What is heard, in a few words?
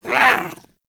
Pet Speak; Enemies; Warframe; Catbrow; PetShip